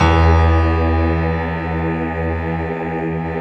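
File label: E 1, PIANO CHOIR; Best Service; Advanced Media Trax Modern Composer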